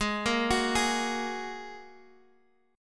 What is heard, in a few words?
G sharp 7th Suspended 2nd G sharp, down, Guitar, Drop D